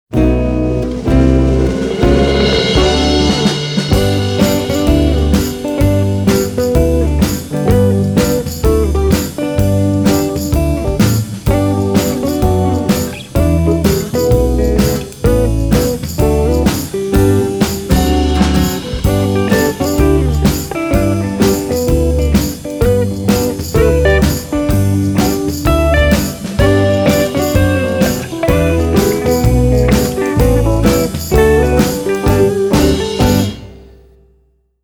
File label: instrumental